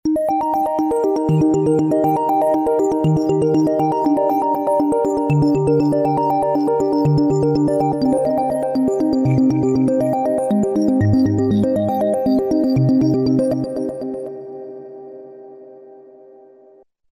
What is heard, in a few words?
Arp Drops, Roland V-Synth; Factory Patches